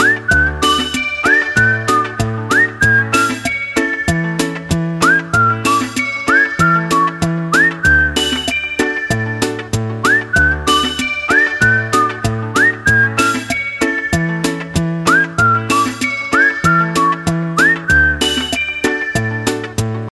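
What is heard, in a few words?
melody and whistle ringtone; melodiya i svist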